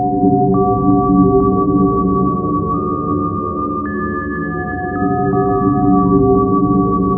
KORG WAVESTATION; Rubber Chicken Software Sample Bank

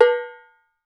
MAMBO COW, COWBELLS; Voice Crystal - Steve Reid Definitive Percussion

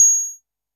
korg trident

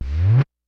KICK SNARE; Kid Nepro; Elite; KICKS SNARES

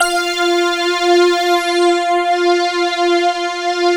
SYN, analog

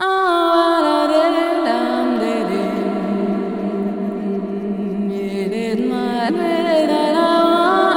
ETHNO REV, Funky Vocals; USB Soundscan vol.18

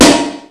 RING-SNR; Rubber Chicken Software Sample Bank; DRUMS + PERC

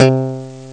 call bass; Amiga Soundtracker Sample Packs

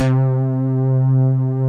LINEAR SYNTH

